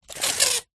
industrial tape measure metal pull; zapsplat

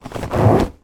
leather chair cushion lift up; household

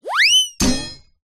cartoon slip fall impact

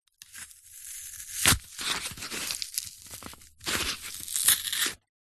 foley paper tear rip from thin wood

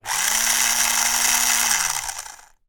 toy car radio controlled free wheeling

